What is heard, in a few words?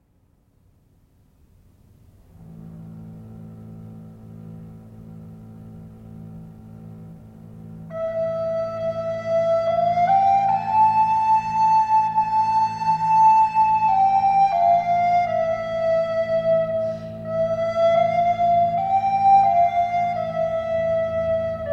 Irish Lullaby